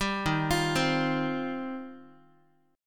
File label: D sharp 6th Add 9th D sharp Guitar; arpeggiated; JGuitar